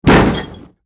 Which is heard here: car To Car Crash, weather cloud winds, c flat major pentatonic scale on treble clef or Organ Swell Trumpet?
car To Car Crash